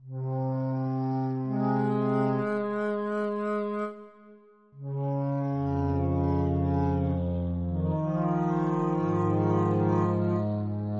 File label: tape loop